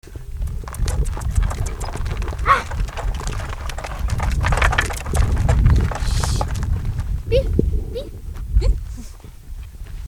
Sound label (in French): bruits de cariole